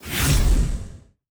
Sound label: pack open common; Animation Throwdown; cardbabies